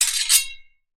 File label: swords x 2 hit scrape